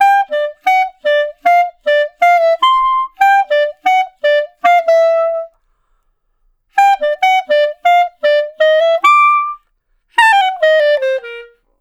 Clarinet One Shot, melody

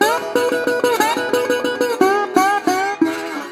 120, Blues Guitar; ILIO - Fretworks

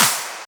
Claps; Vengeance; Essential Clubsounds Vol.4

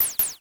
Dual-Percussion-Synthesiser; Klone

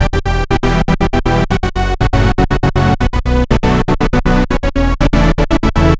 Trance Synth

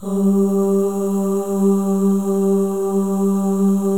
OH, FEM, Symphony of Voices; Spectrasonics

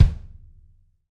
Pop Kick; Roland BK-5

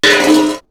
Metal Crash, perc fx; X-Static Goldmine

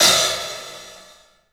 HAT, CLUBHAT, 3D Drums; 3D Sound

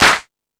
Crash, Drum Machines E-MU Pro-Cussion

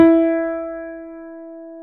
CP70, Keyboards Old School; USB Soundscan vol.09; AKAI

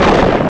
thunder groll; ST-XX Amiga Soundtracker Sample Packs

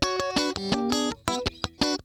Funk Guitar; 90 s Sample CDs; Zero G

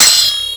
SW HIT, MORESWORD; Total Control - Action Cutz

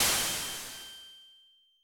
Crash Noise, Genesis; Urban Flow

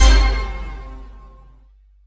O-HIT; Back In Time Records; Big Bang; THE HIP

